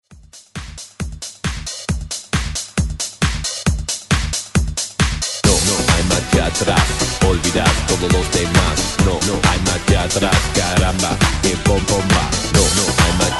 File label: 90s Dance Hype ReDrum 135 Bpm